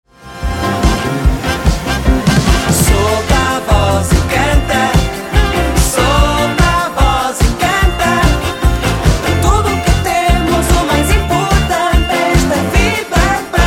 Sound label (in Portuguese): Solta a Voz e Canta